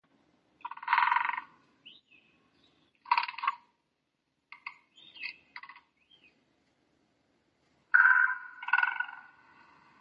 Pic Epeiche

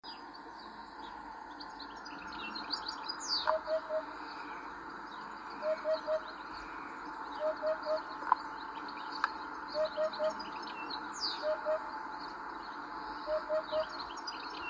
Huppe fasciee

